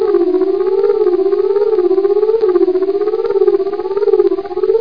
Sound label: Love Birds Coo; How Many Bugs in a Box; juicebar